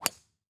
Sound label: Sports Golf Ball Hit Tee Off